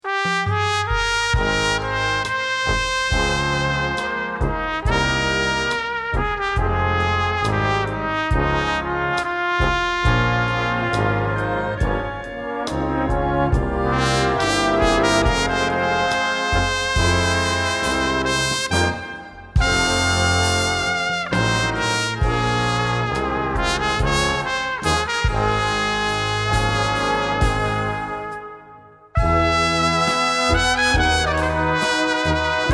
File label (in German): Trompetentraum